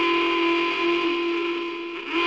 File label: alarm; Soldier Of Fortune II Double Helix; XBOX; ambience